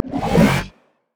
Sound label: basic attack; Vel'Koz